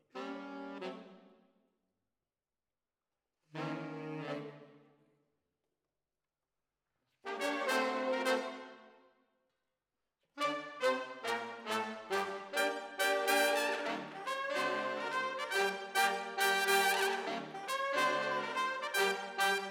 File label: ORTF, Jazz for piano